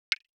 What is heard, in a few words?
Chat alert; MeeGo Ringtones